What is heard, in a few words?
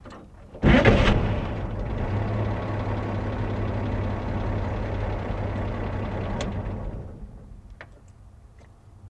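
Chevy Truck 46 Start Idle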